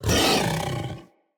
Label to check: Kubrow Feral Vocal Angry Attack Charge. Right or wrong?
Right